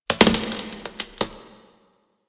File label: Infested Glass Crack; Warframe; Corpus Infested